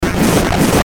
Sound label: GLITCH DIGITAL, Glitch Digital Feedback Phase Fuzz; glitches; Blastwave